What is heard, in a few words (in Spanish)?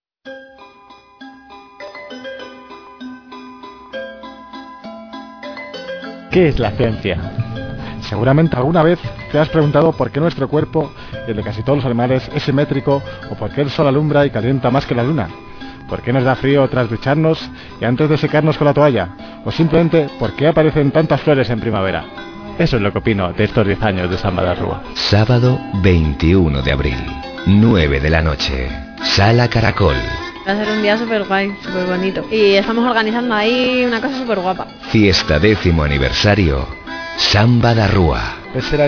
samba tierna